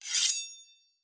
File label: Weapon Draw Metal; Fantasy Sounds Bundle; Medieval Combat Sounds